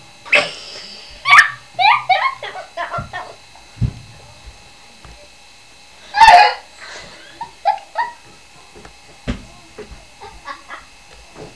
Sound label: giggle